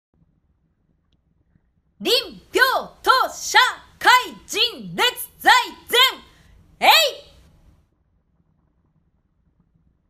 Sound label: voice kuji